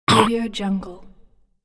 short pain critter monster voice

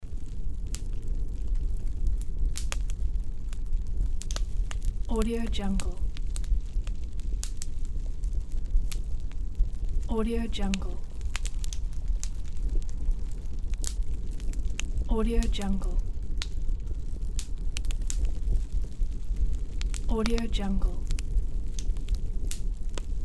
warm fire ambience loop

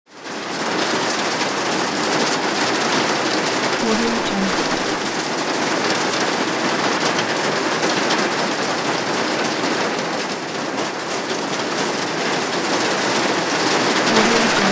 rain and hail

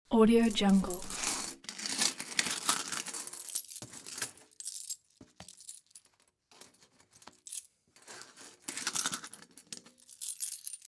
many coins taking

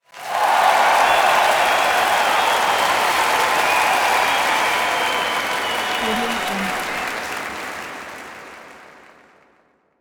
crowd cheers

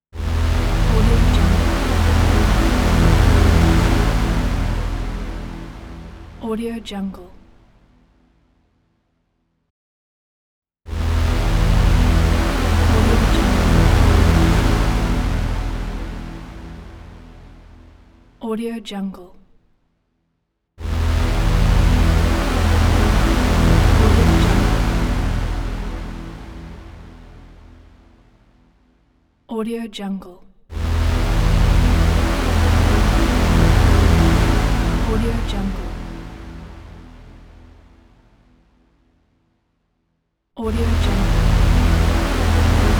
cinematic epic rise; boxsound

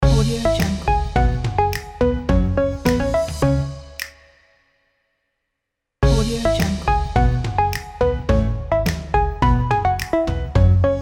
simple modern logo

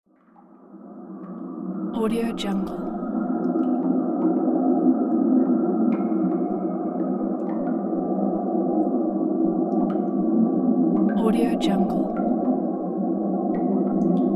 cave ambience

